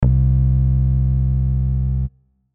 pick fx

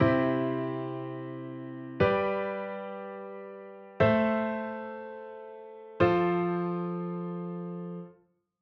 hikigatari chord

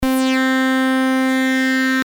dist post

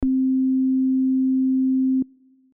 RnB Lead vco

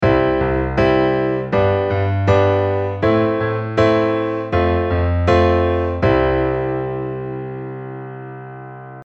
8 beat

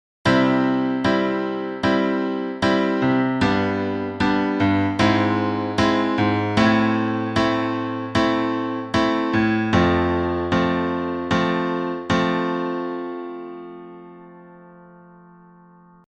triad aug

